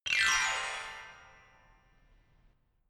notification glockenspiel horror slide down hard

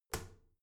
toilet lid wall open soft; haus-garten; badezimmer

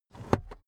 car headrest full adjustment quick

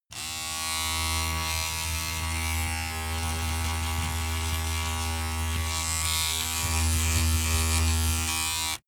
razor on load pov short

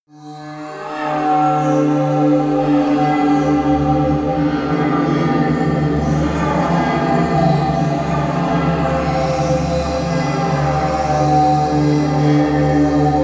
drone hell swirl

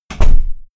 pulling door shut

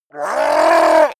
goblin scream short pain

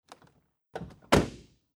jeep door open and close

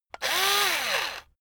electric drill screw out medium; maschinen; bohrer; soundpool

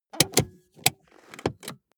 car bmw e46 interior handbrake release long

